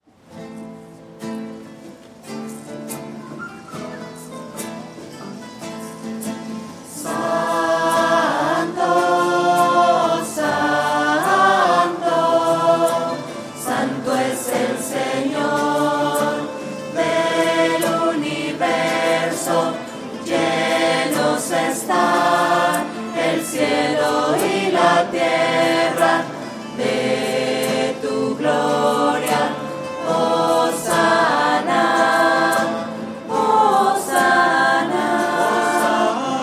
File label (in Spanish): santo lento y triste, voces